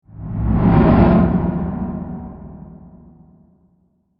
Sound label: Whoosh Dark Low Airy; Whooshes; Sound Design; Production Element